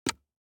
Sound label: DICE on Hard Wood, Grab, Standard, 2 Two Dice; Samples; ArticulatedSounds; DICE Sfx Party (Extended)